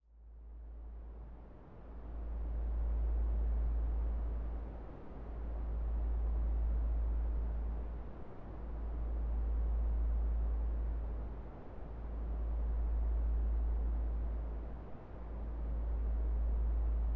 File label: drone warm